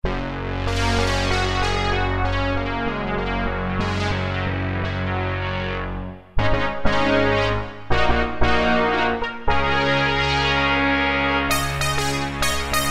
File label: R-Mod Brass Pad, Roland JD-990; Factory Patches